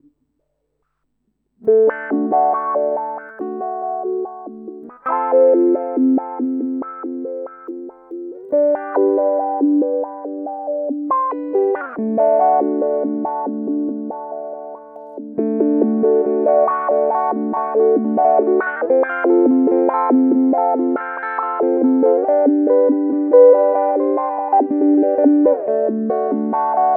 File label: pick arpeggiator